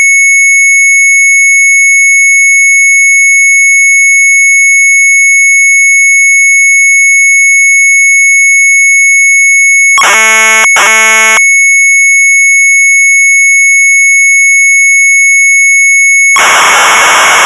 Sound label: Sharp MZ800 Tape; ADRES-800; retromelsarchive